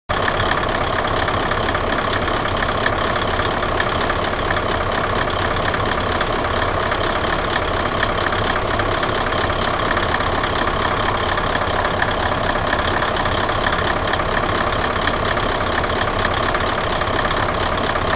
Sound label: idle, rail; shimatetsu; kiha2013